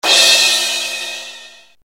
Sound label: LINN CRASH, Linn 9000